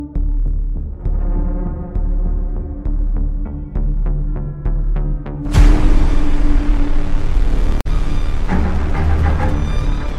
Spree BGM; Anjaam Pathiraa; Malayalam

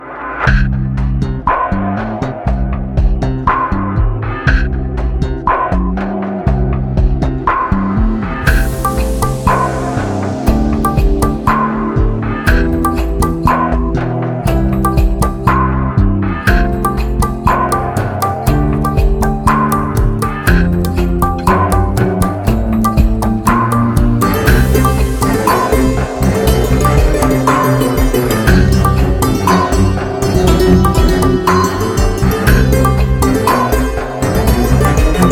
Karaoke Version, Tamil; Amara Kaaviyam

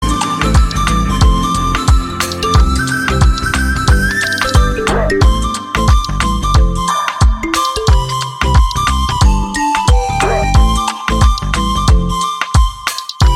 Love BGM; Dikkiloona; Tamil

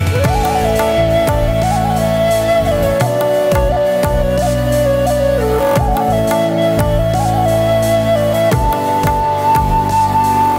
Instrumental

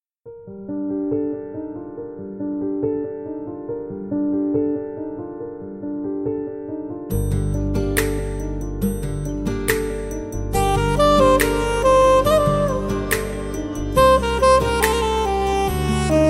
Love Theme Music, Malayalam; Take Off

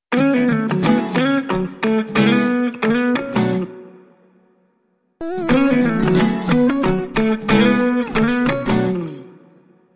Starting Musiq Tone; Agnyaathavaasi; Telugu

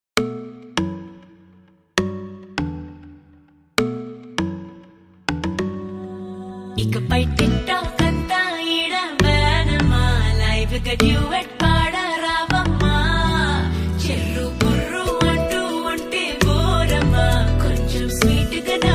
Ringtone, Telugu; Varun Doctor

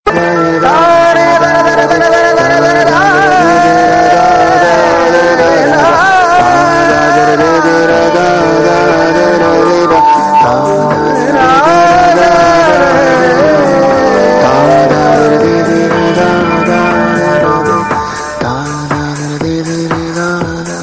Ultimate BGM Ringtone, Telugu; Vedam

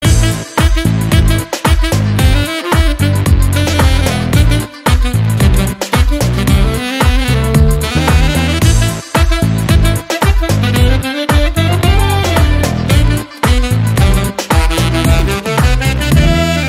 Instrumental Bgm Ringtone; Rowdy Boys; Telugu

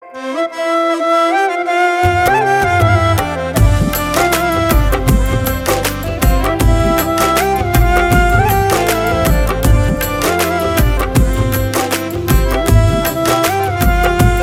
Song Instrumental Ringtone

